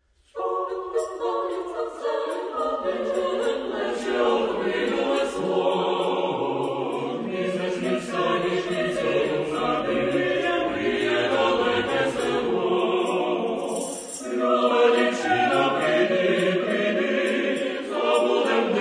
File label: ukrainian folk melodies; The Boat Is Rocking